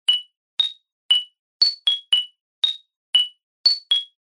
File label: Ticker, ringtones; Magic